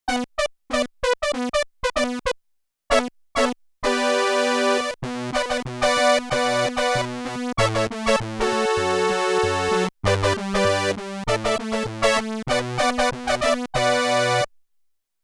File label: Double Prophet Brass, Prophet 5; Yamaha TX16W